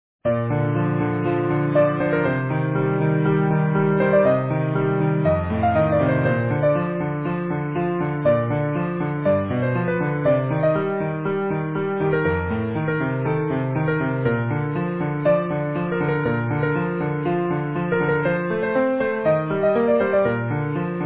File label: Easy Piano